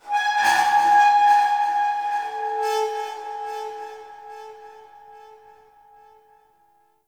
Crp Ship Metal Scrap Texture Med, Corpus Ship Ambience